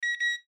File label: Metal Detector Wand Beep Double Airport; Industry